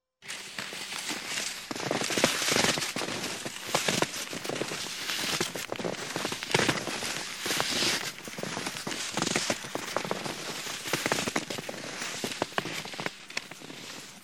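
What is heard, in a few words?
two skiers run by one after another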